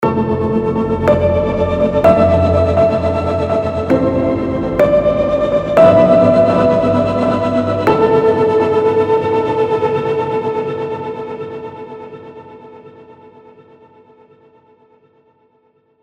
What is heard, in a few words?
Tape Pianistic Shimmer; Heavyocity Mosaic